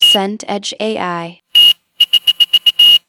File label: car reverse beep